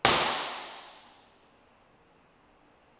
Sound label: Bathroom ImpResp